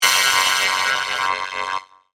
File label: Alarm Warble, Production, Element, Alarm, Warble; Warbles (Sound Effects:Sound Design); BlastWave